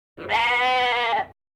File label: goat